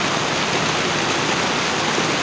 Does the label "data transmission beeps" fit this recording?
No